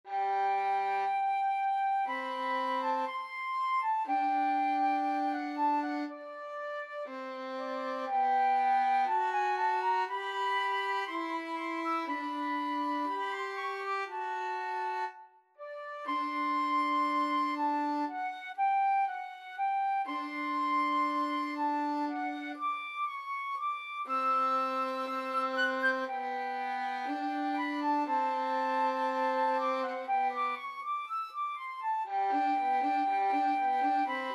flute violin duet; romance

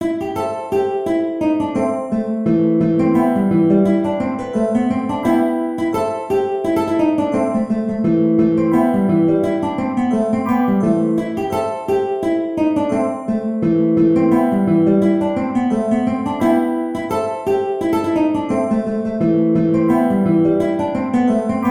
Breakdown, guitar

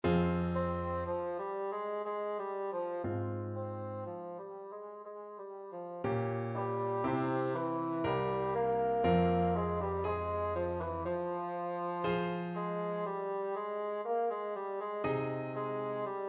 telemann, bassoon

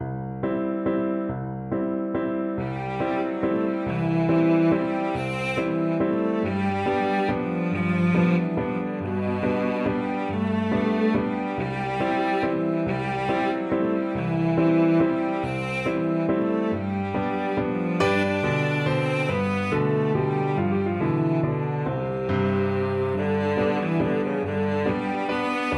cello

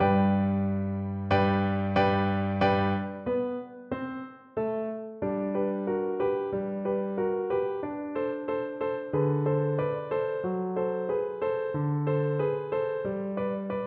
flute

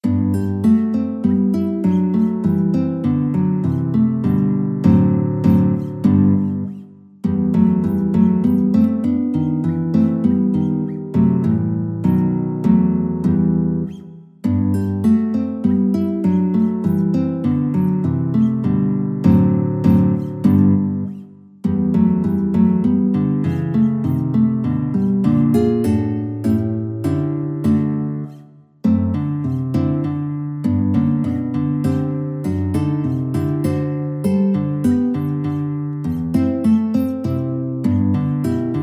Contradanzas, guitar

